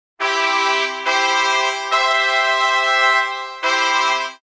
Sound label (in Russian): fanfary